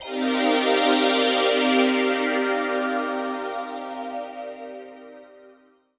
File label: Careless Synth; M8